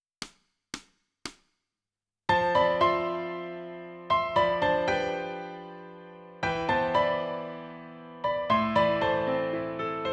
Piano

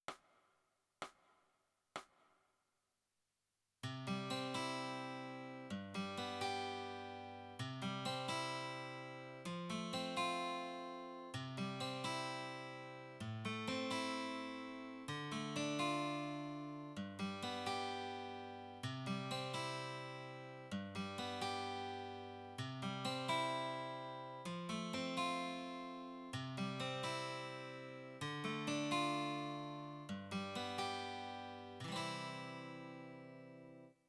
Guitar

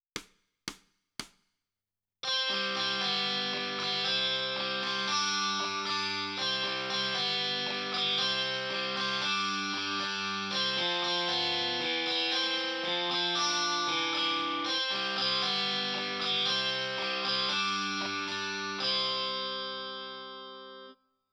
Guitar

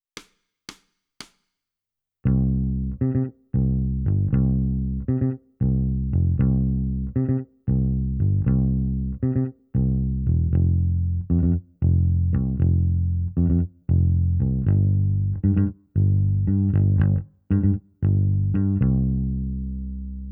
Bass

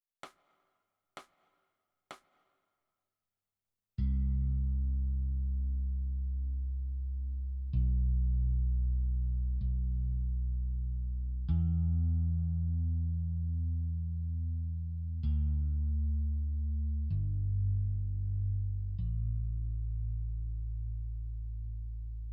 Bass

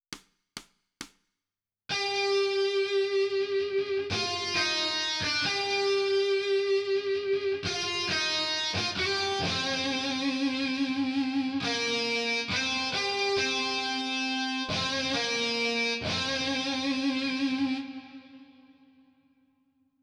Melody

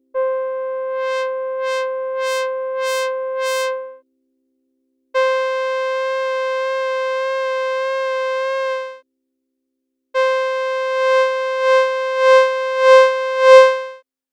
Synth; プロの音プロの技・令和版

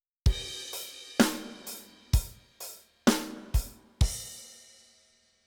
Drums Training; 耳コピが基礎からできるようになる本

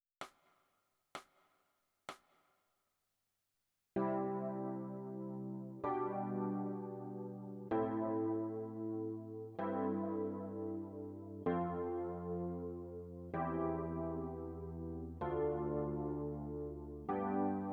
E Piano

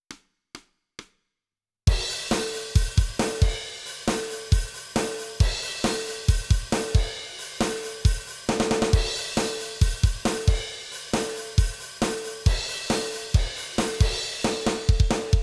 Drums

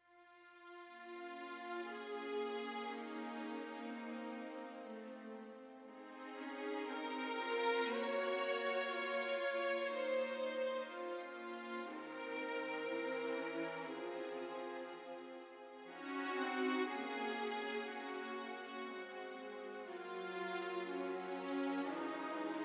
Orchestra All; オーケストラサウンド; 新・DTMオーケストラサウンドの作り方

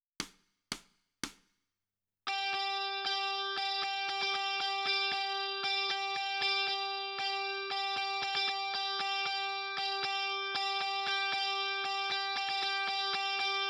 Guitar Right

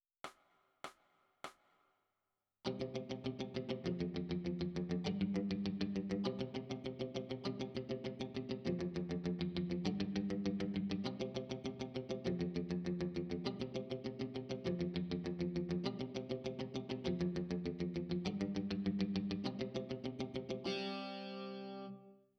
E Guitar

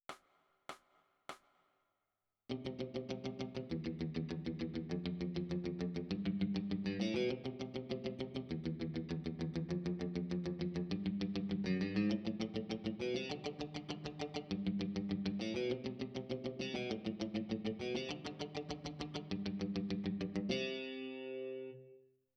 E Guitar

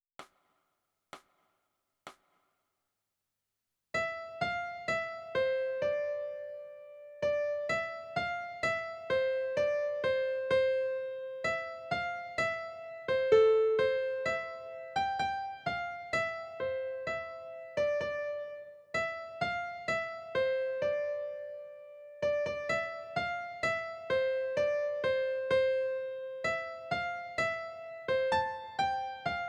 Melody

